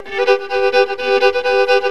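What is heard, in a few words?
G FIDDLE GROOVE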